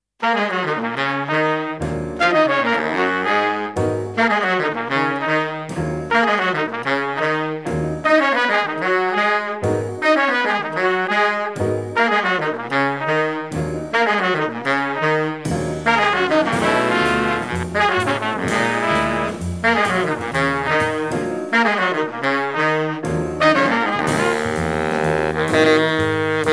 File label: chronic blues